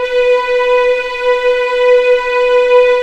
B4 LEG P, VIOLINS; East West; The Ultimate String Collection

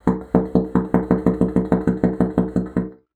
BASSOON; Xsample VOL-6

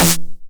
909 SD Tape Sat; Goldbaby Tape808 & Tape909; The Tape 909

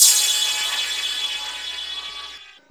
PROCYMB, CYMBALS; USB Soundscan vol.20 - Fresh Disco House I

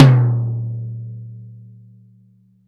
TOM, Drum Dry; East Collexion; Drum 1

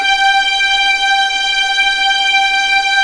G5 LEG F, VIOLINS; East West; The Ultimate String Collection

